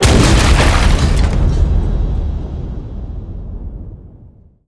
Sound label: fall, tardis; p00gie